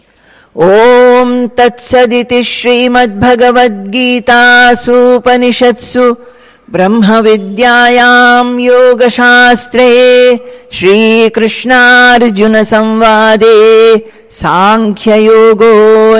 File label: Ending Chanting; chapter 2 sankhya yoga; bhagavad gita; conclusion